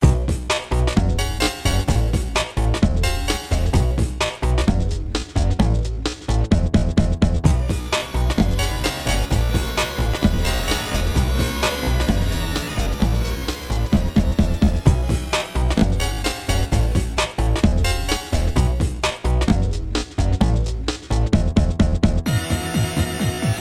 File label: Disco Wave; MIUI14; HyperOS 1.0